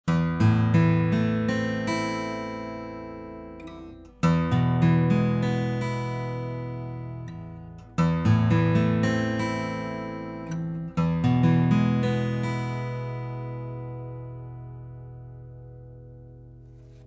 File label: Guitar Picks